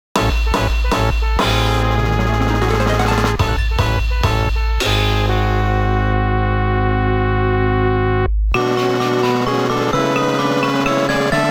全ラウンドクリア; All Target Cluster

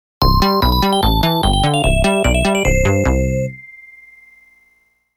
ラウンドクリア; All Target Cluster

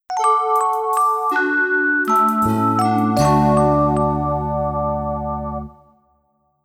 ジングル -「自宅」; tokimeki memorial drama series vol.2 irodori no love song

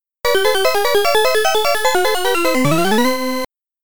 ゲームオーバー・ミュージック; Pac & Pal; Pac-Man; arcade

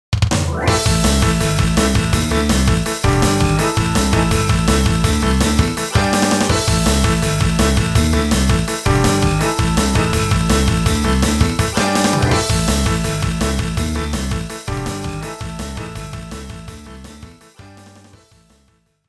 ホームランジングル, 野球; power pro kun pocket 12; ホーームリャン!

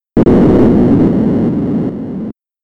クラッシュ音

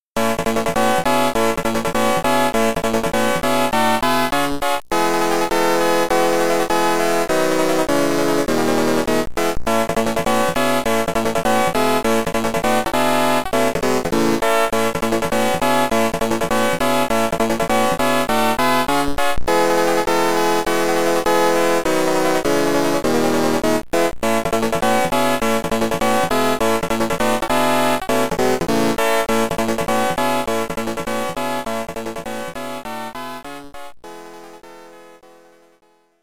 名前入れミュージック7～100位

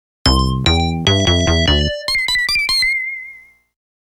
ゲームオーバー; All Target Cluster

